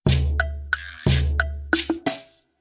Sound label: percussion